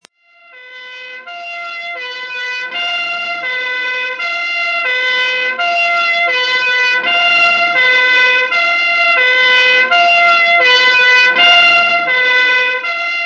foreign siren; amb elements; modern warfare 2

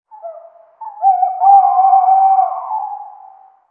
nocbird dist; amb elements; modern warfare 2

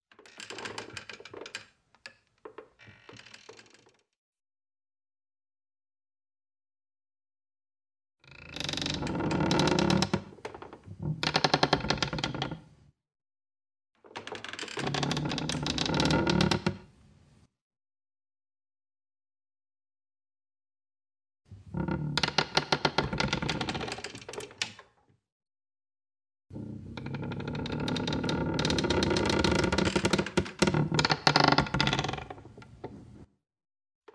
wood creak heavy; modern warfare 2; amb emitters

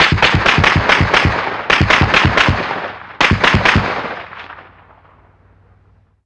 gun 50cal med; modern warfare 2; amb elements

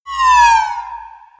barrier down; modern warfare 2; crosscode

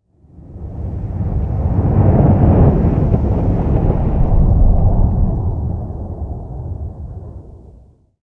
jet flyover stereo; amb elements; modern warfare 2

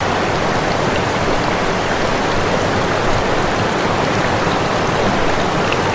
waterfall; modern warfare 2

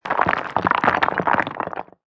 rubble; amb elements; modern warfare 2

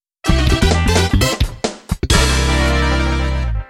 course clear; modern warfare 2; super-mario

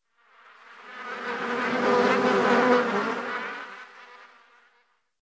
insect fly; amb elements; modern warfare 2

